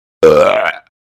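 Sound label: sound of burp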